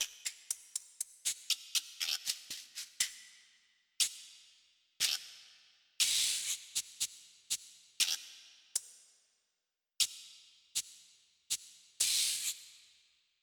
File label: Latin Shakers